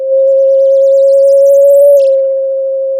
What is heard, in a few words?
Sound of a police car siren